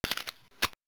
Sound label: pistol pickup